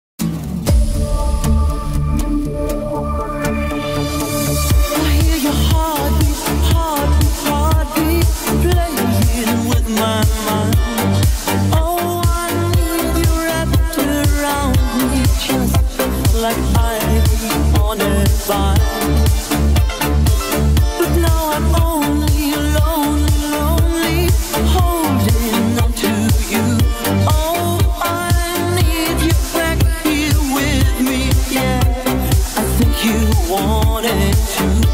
remix

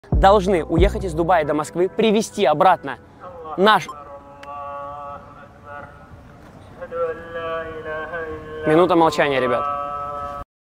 azan; reakciya blogera; islam; mixail litvin; russkie musulmane; umma

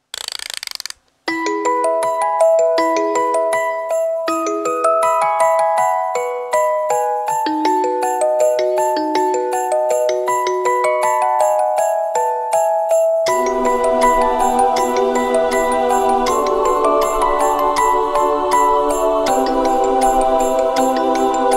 new main menu music; ice scream 8 update 2 0 9

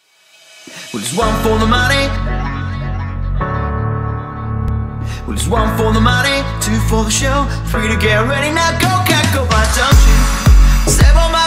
remix

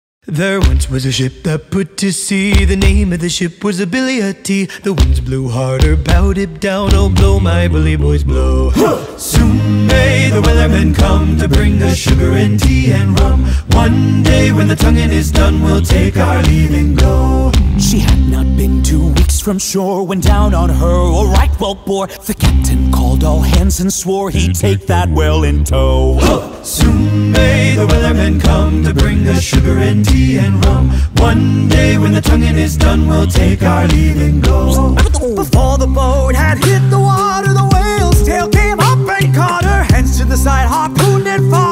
acapella

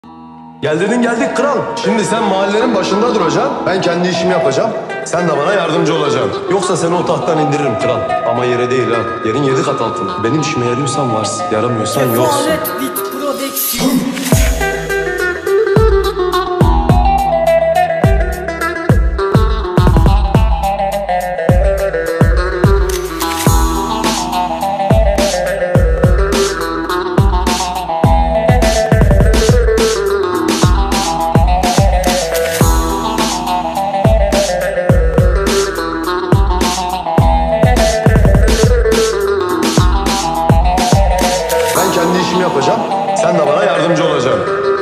trap remix

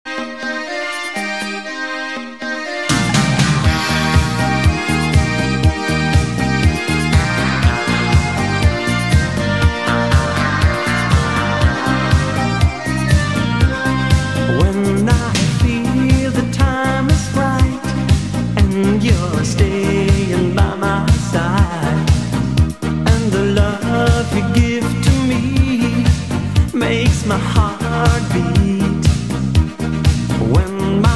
shuffle dance remix

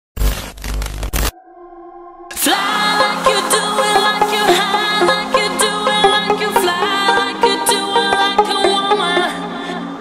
reverb

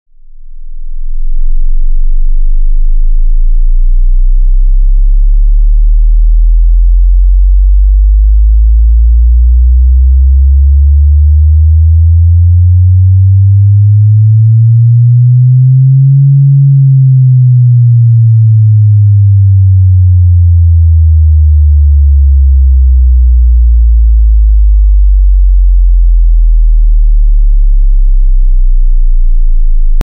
bass bassboost hard bass; samiy mo niy i krasiviy bass v mire

